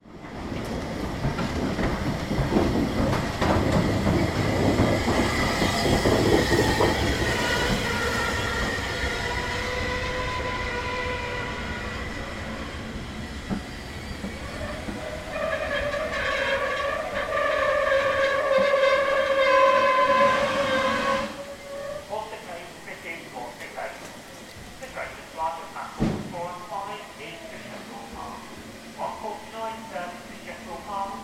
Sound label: transport steam train arrive at station with tannoy announcement